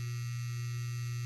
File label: vibrator medium